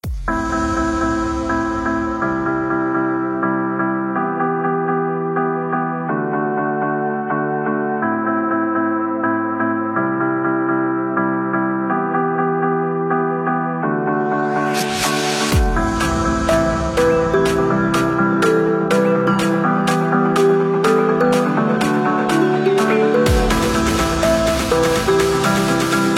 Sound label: Hybrid Keys; PlaySeries; Komplete